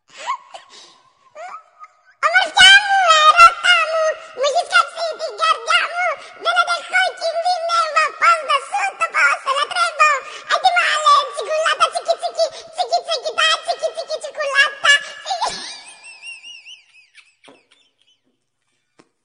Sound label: baby babbling